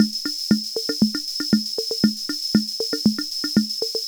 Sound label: Loop, classic machines, rhythm77